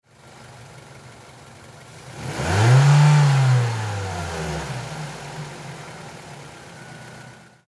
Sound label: Car Mazda 3 Medium Rev; Power Car; Car 2009 Mazda 3 Medium Rev; Car Sound Effects; LYN110 package of Power Car sounds